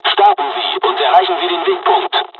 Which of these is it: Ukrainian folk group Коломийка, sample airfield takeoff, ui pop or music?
sample airfield takeoff